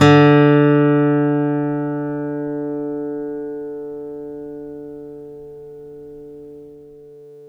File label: STEEL P D2, Synclavier, GUITARS; Synclavier Sampler Library